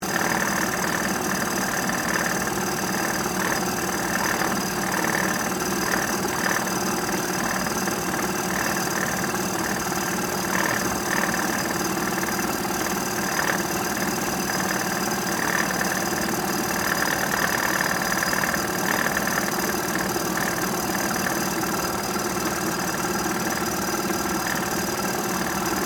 Paint Mix Machine, Industry, Auto, Body, Shop, Paint, Mix, Machine, Loop; BlastWave